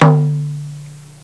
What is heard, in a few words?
indian drum, percussion instruments; Sound Effects for Multimedia - Volume 1